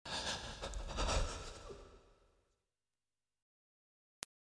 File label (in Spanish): suspiro